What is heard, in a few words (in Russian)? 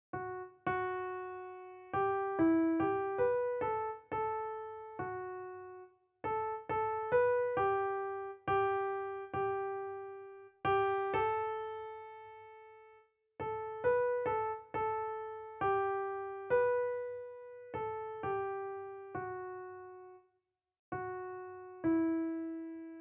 muzyka